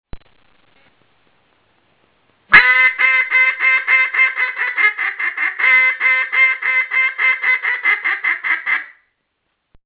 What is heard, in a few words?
Comeback call